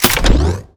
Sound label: sci-fi weapon reload; Gamemaster Audio; Sci-Fi Sounds and Sci-Fi Weapons